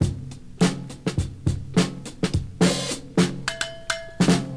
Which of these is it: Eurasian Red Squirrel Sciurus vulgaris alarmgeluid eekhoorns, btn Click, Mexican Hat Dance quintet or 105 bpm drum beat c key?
105 bpm drum beat c key